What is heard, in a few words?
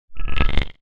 Sci-fi Organic Rattle